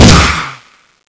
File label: boosterzombie booster; zmall